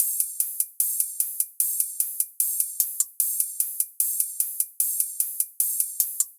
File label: top loop, 150; selfish